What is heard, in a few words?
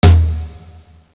tom lo; FISHWISH